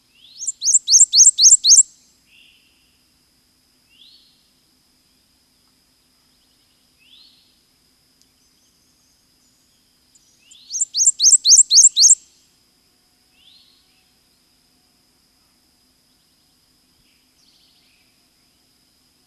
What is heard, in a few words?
Prothonotary Warbler